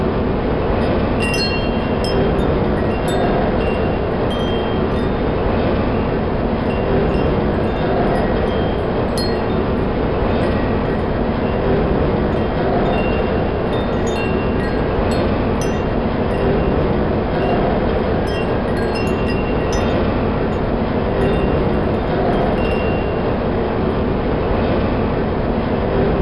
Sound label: background sound; estate christmas23